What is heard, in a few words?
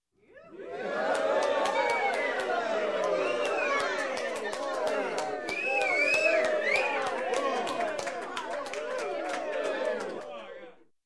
Crowd applauding and whistling